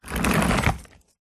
household vacuum cleaner roll on tiled floor while off short